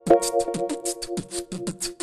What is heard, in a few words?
beatbox harp